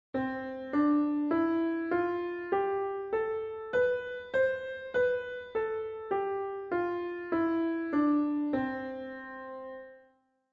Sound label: C Scale Piano; California Music Conservatory